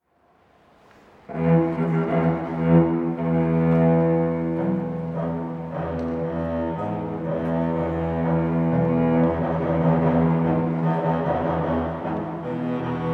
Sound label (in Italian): Tromba Basso; Colombi